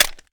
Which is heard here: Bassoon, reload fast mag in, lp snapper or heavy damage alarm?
reload fast mag in